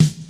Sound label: tight low end acoustic snare d sharp key